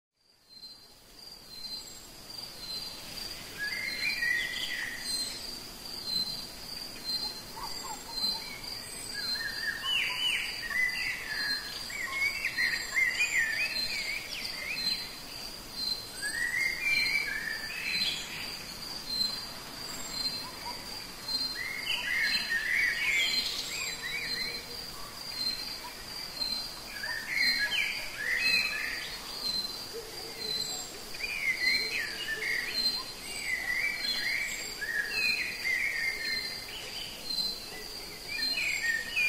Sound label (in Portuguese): Floresta Ambiente